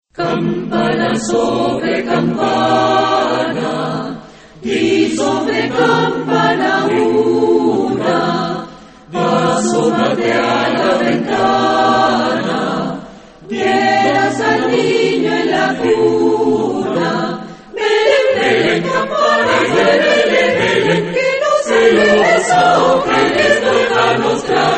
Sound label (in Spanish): campana sobre campana